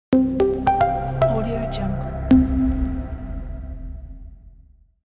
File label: short ads logo music